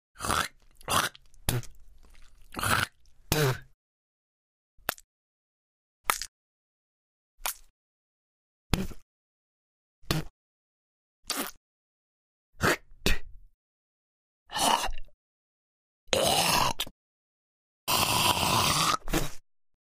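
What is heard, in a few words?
human spitting sounds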